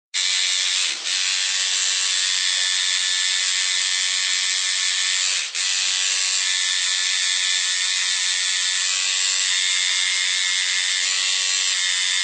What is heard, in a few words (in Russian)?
pochti neprerivnaya rabota tatu mashinkoj